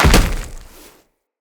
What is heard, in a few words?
Tenno Land Heavy Dirt, Footsteps; Characters